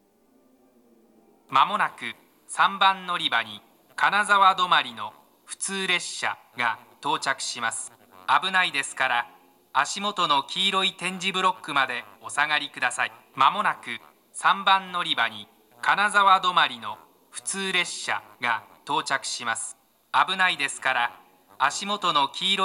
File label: kanazawa stop local